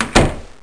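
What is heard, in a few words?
door close, fire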